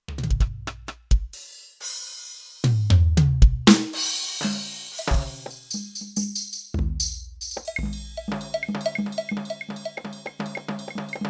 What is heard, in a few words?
Studio Kit, Kurzweil K2500X; Factory Programs